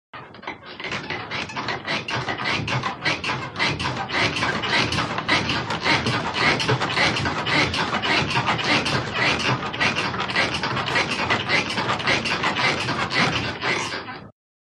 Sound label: HOUSEHOLD BED: INT: Creaky old wooden bed, steady rhythm.